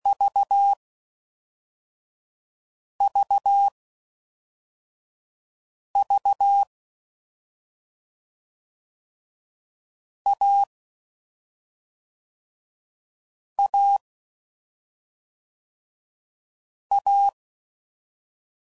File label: CW Code Groups 5WPM, Difficult Combos; HamRadio; Morse Code; Mixed; Combinations